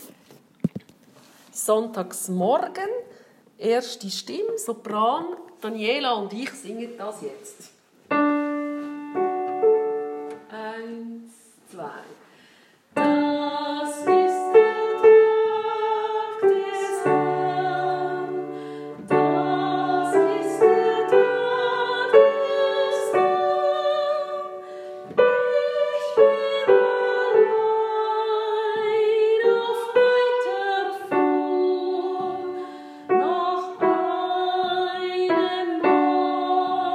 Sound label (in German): Sonntagsmorgen Sopran